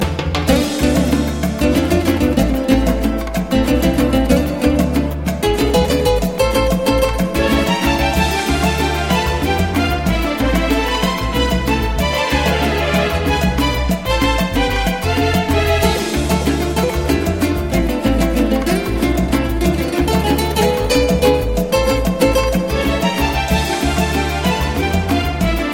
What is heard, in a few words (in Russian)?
krasivoe flamenko; Latin